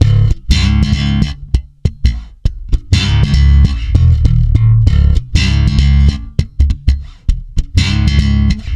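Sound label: C#, funk swing, bass; Spectrasonics; Bass Legends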